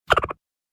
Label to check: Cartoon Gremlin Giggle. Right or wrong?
Right